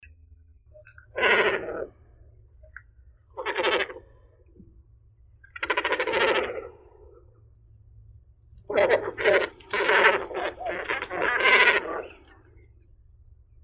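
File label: great cormorant, phalacrocorax carbo; baklan bolshoi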